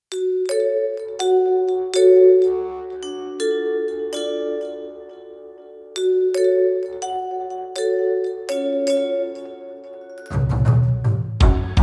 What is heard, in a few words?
spooky groove sample; Krátká instrumentálni/ambientní skladba s nadlehčeným duchařským motivem